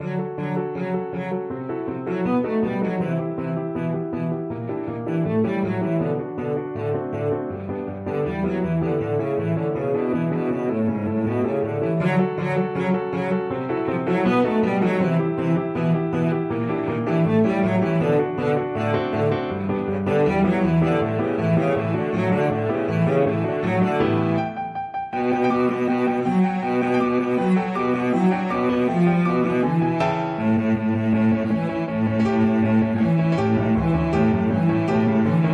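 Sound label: violoncello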